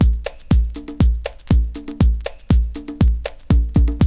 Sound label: 120 DRIVE, Loops; AMG; Now CD-ROM (Roland)